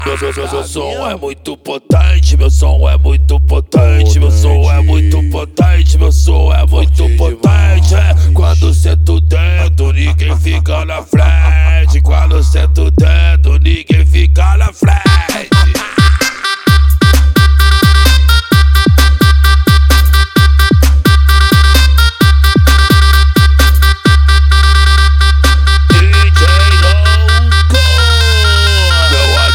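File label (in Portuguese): mega funk mix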